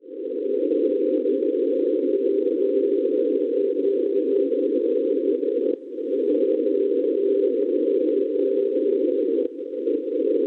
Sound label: NDB, 421 kHz; MF; Halmstad; Svezia; Schwarzsee